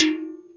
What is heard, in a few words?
Oil Drum Impact; Effects; worms